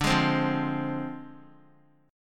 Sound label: D Minor 7th Flat 5th; Dm7b5; strummed